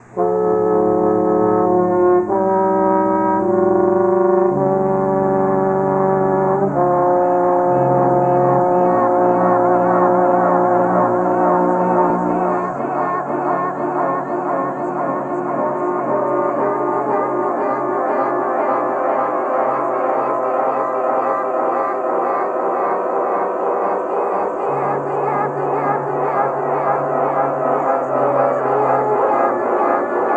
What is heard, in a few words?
joyful noise